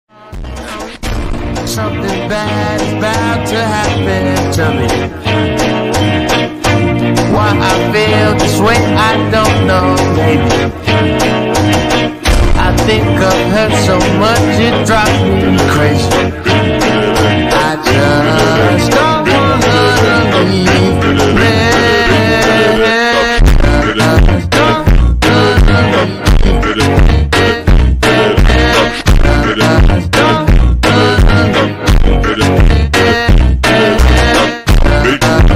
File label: bad happening funk tiktok ultra slowed reverb